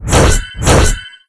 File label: attack axe